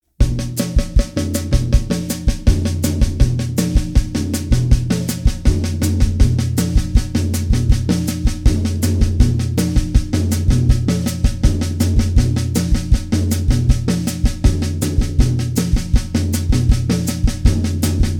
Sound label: Samba-Groove; Samba-Groove 2; Schwarzes Album; Bum Bum Tschack Jazz Latin